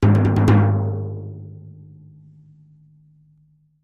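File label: Drums Toms B Shrt Battle, drums tom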